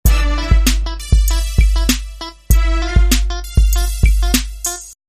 Hardcore

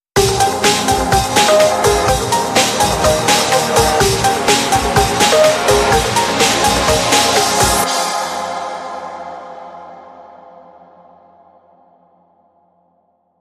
Sting

